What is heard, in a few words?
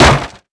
bouncy plywood hit; bouncy objects; Tony Hawk's Project 8; XBOX